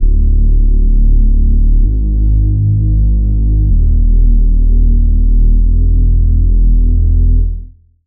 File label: Reese; Killing Contract; Routed Sound; Spire Expansion; Noble Gangster Vol.1